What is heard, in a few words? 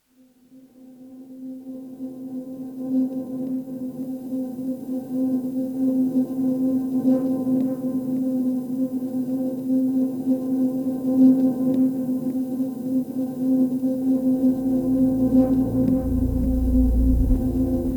tape